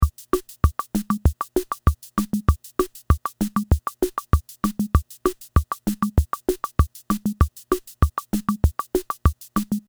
casiotone samba setting

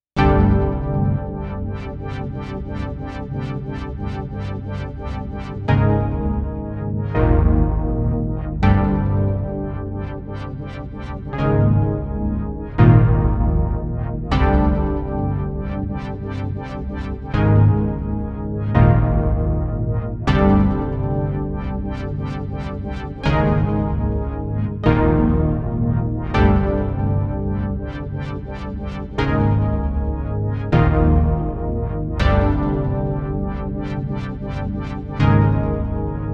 Polyphonic Synth with Subs

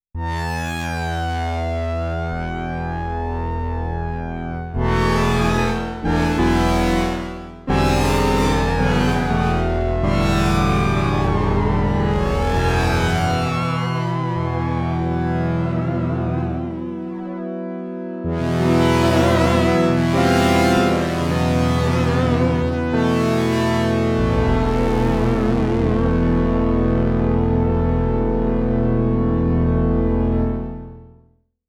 Stereo Filter Sweep

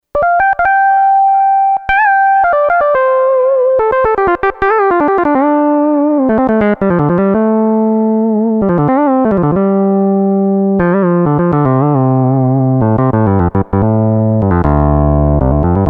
Quickly Dialed in Lead Patch

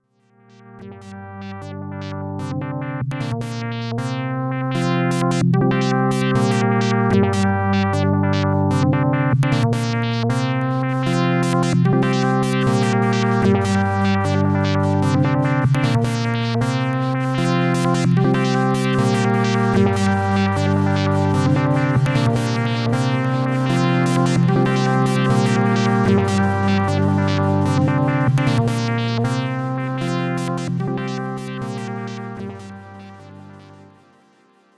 MKS80

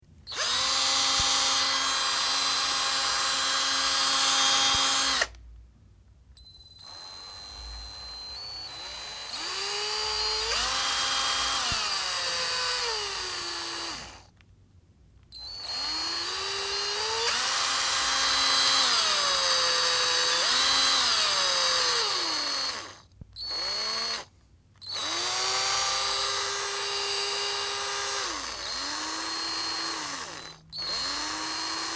electric drill recording

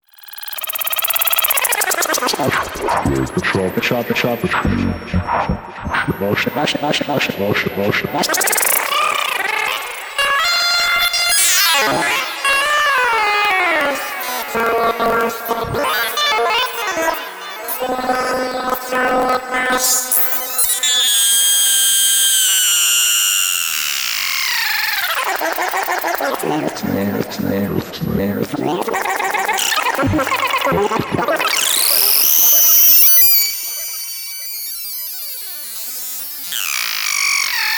Grain Machine

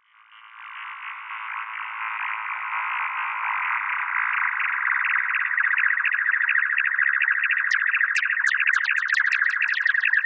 sunset noises

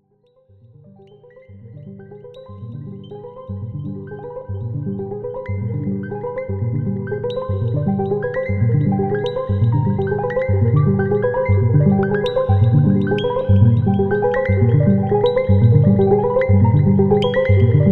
Arpeggio High Note Glitch

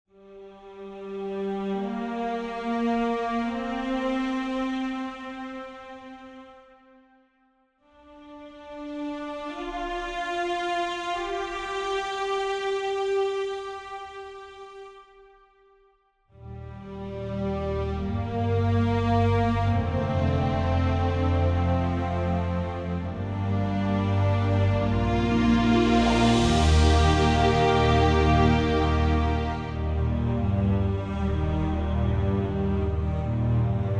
Tom Player; Mockup

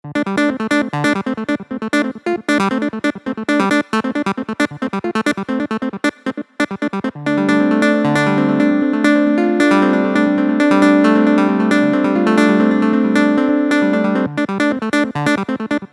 Tempest Synth Clav

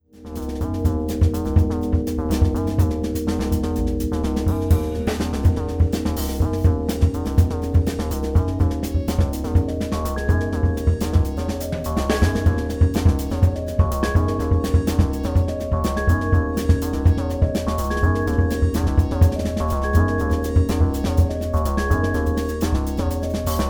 particle system jam

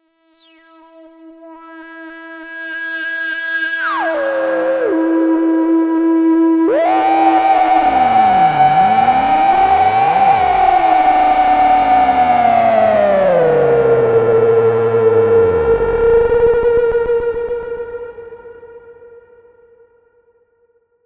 Over Resonated Monotron Filtering

